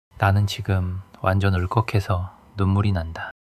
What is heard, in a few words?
crying